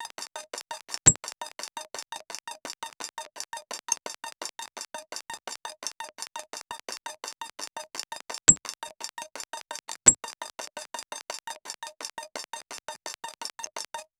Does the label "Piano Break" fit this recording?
No